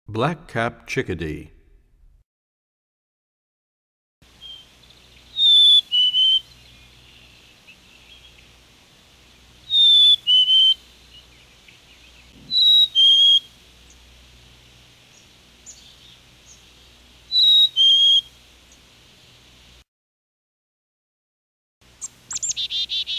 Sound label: Black Capped Chickadee, bird songs; 101BirdSongs